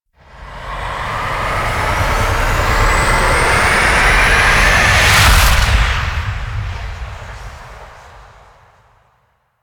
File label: Liset Quest Extraction Depart, Vehicles; Stalker Liset Skin; Flight